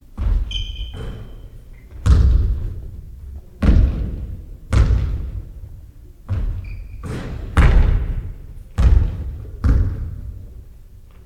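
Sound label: basketball shooting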